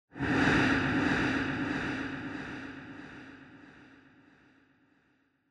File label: Scene Flashback Short Delay